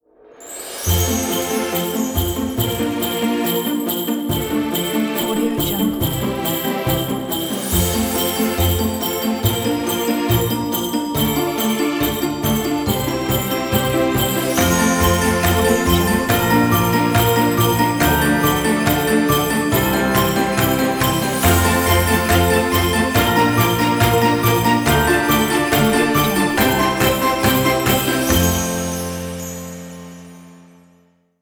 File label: holiday ident